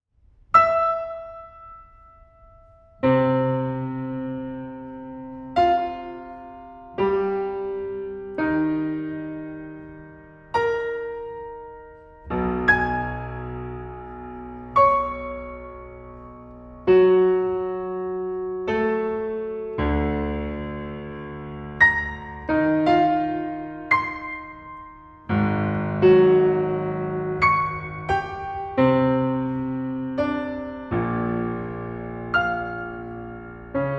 piano trio; rock hill variations; ...arching, reaching, breathless

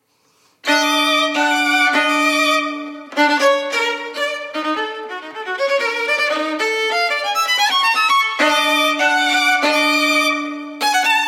partita for solo violin capriccio

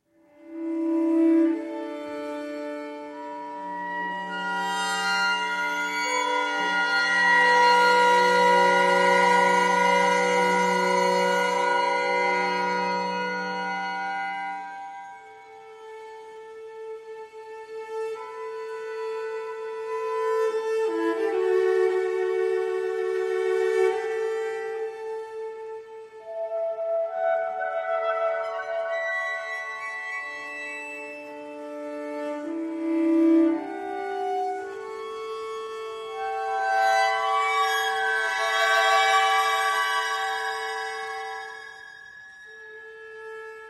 cello concerto

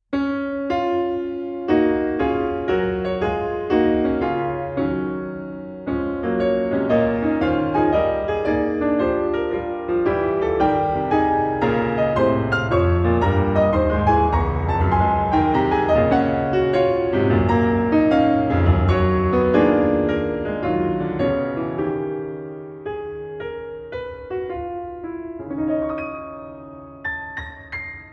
piano sonata

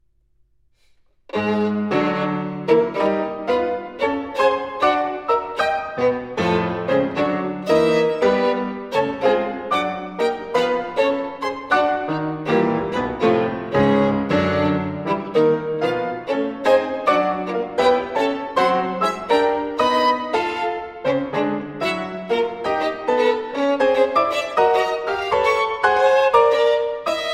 trio for violin horn and piano alla marcia

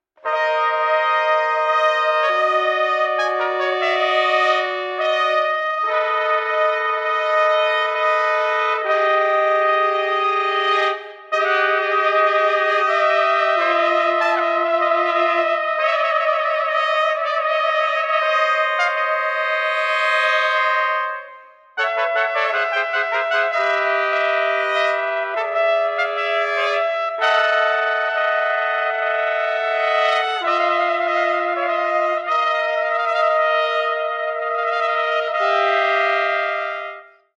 allegro, horn; trumpets; fanfanfaren iii; Andy Kozar

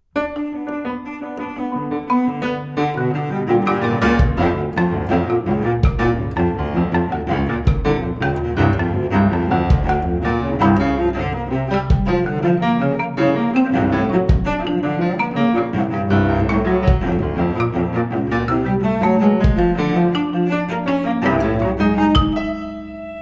trio

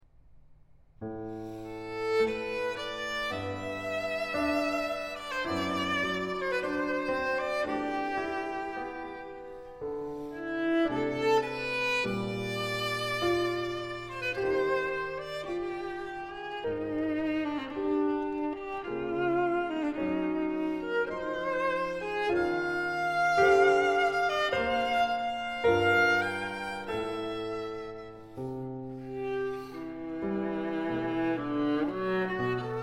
sonata for viola and piano largo

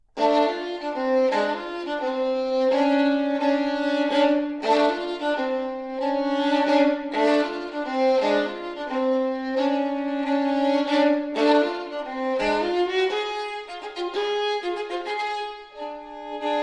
tarka flute song; tarqueada

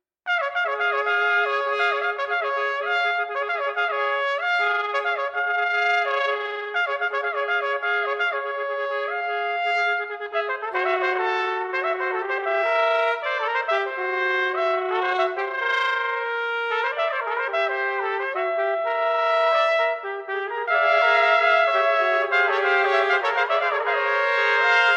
allegretto, horn; trumpets; fanfanfaren vi; Andy Kozar

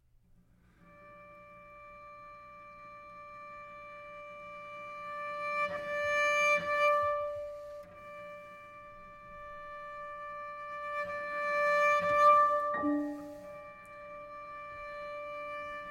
sonata for cello and piano lontano

